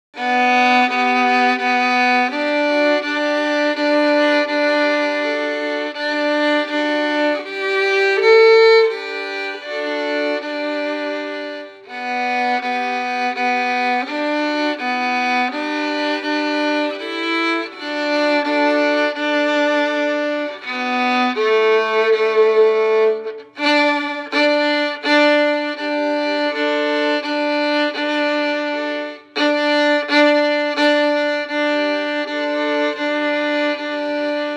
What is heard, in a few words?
Hark the Herald Angels Sing, violin